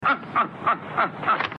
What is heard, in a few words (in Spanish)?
risa; curly